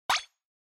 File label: Onoma Surprise Low